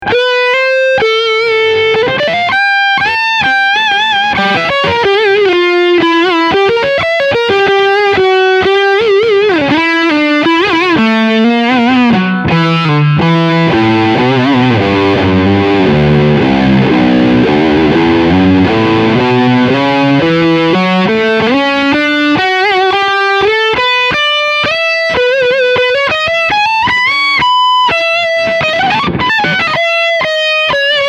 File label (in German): Lick Steg HB